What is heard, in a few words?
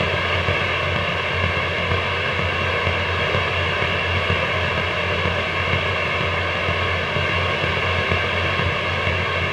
Ambient Machinery, Background Machinery; Julian Ray